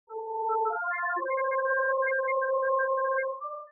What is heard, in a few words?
trpt